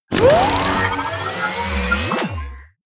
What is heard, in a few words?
sci-fi construction construction start; hits impacts